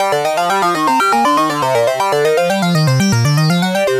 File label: Synth